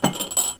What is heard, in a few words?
mug